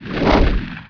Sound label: whoosh